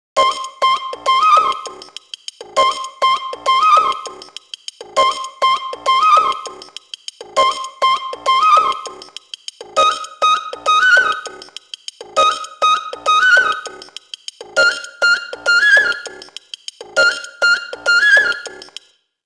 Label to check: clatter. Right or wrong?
Wrong